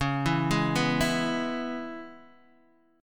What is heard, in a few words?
Db Minor 7th Db Guitar Open D